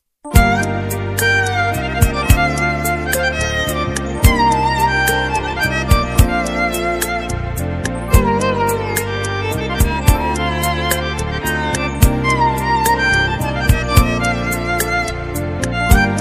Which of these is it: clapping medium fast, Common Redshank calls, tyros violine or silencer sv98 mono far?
tyros violine